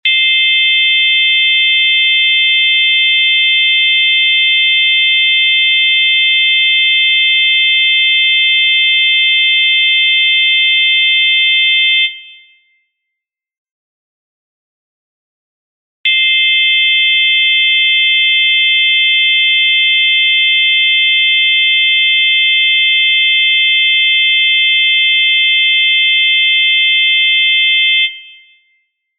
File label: Interval Test